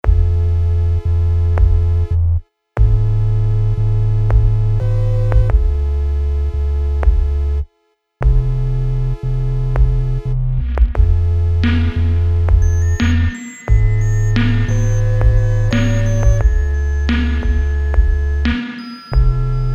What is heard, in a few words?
SINE WAVE